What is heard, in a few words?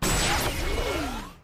transform back; omnitrix; steam camp